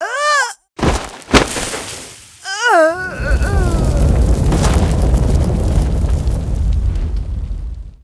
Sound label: die; FFocalor; Seer; GamigoNA